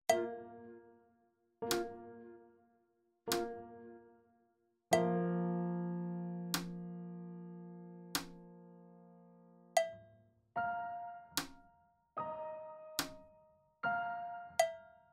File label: piano flute slow; Uebungs-CDs; Playbacks